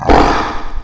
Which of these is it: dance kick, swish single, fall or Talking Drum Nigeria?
fall